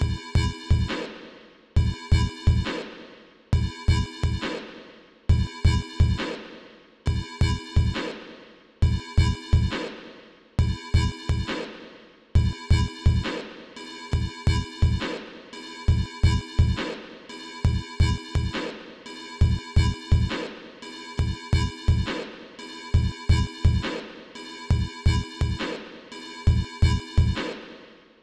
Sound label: sine